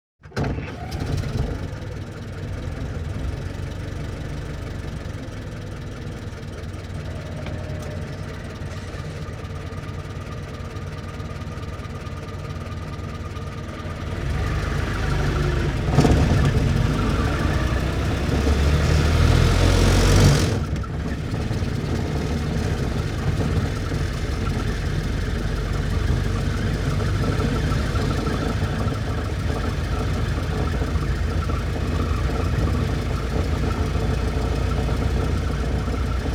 1924 Ford Stake Bed Truck Int Start Idle Away Long, Period Vehicles